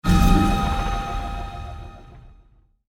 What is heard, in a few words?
laser end; voidling